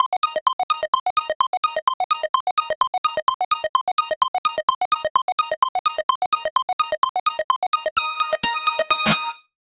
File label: casino reel sound high re, mechanical clicks